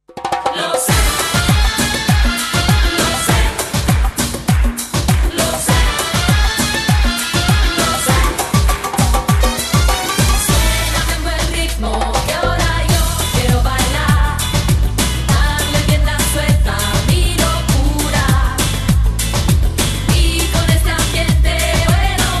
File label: samba